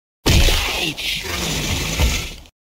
yeouch

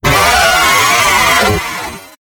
hybrid jumpscares scream

